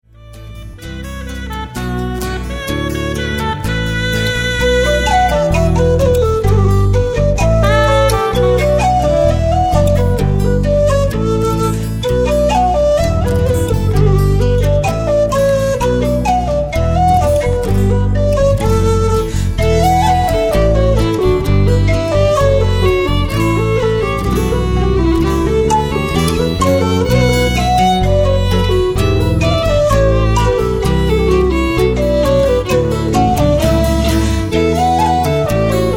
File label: slow reels